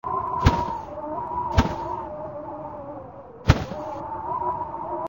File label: Flag